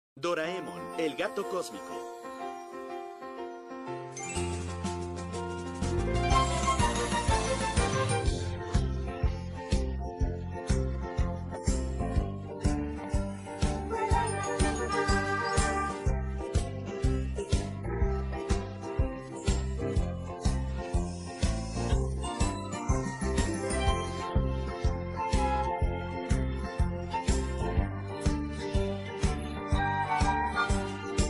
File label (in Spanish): opening karaoke en español latino; doraemon opening 2